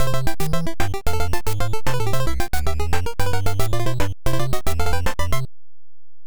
Game Start

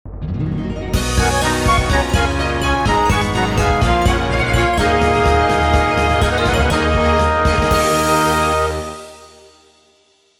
Jingle Stage Start; Gensou Shoujo Wars: Dream of the Stray Dreamer

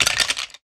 many bones; Crab

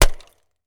axe chop log

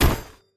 Weapon Crowbar Hit

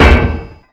Lamp Post

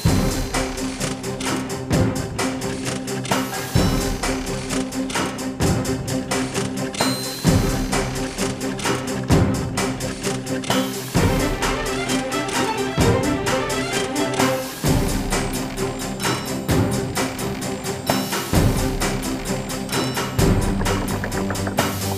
menu; mountain meca

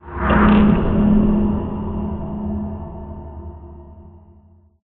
Crawling Sting; PWND

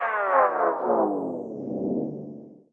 find opponent search music winddown

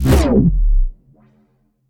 Impulse Gun Stop; we are the dwarves gamerip 2016

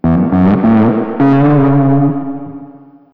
Bass Melody; Hylics

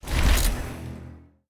Ui Select dome Stereo

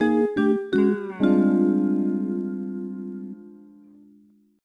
Jingle Sad; Petz Rescue Wildlife Vet; NDS

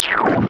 Button Press

